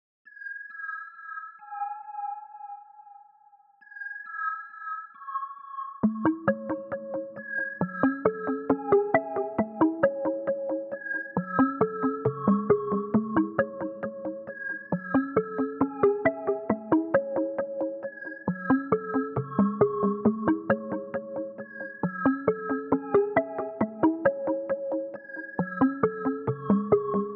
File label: 135 BPM C# Min Synth; Cymatics; Miami Guitar Pack - Pt 2; Mania